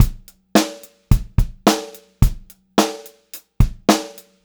POP BEAT, Pop-Rock Drumloops; 108 POP

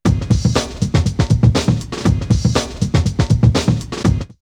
Dirty Beat, drumloops, OldSchool; X-Static Goldmine

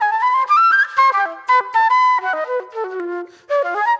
Soul Plate Flute; Sonic Foundry (Sony Creative Software); Manhattan Flutes; Session 03 - Classic Caddy

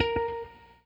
ROCK, Guitar; 90 s Sample CDs; Quantum Leap - Guitar & Bass

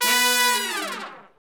BRS LNG FA, Section FX R&R Falls; Roland L-CDX-03

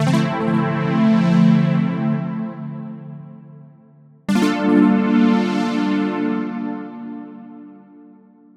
112 D Chilled Strummer, Melodic Loops; Electronisounds

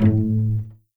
A1 PIZ CEL, string; East West; The Ultimate String Collection

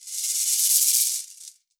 shek, WORLD PERCUSSION; Best Service ProSamples vol.52 - World Instruments 2; MIXED PERCUSSION

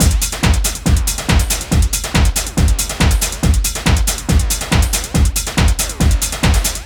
DRM, LOOPS 140, House

